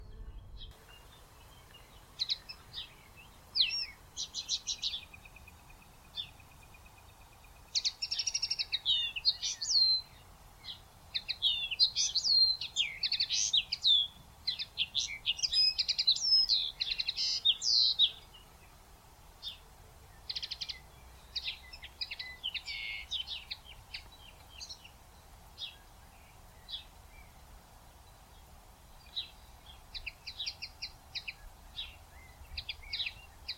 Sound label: Common Linnet Sound